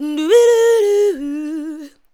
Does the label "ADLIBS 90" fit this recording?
Yes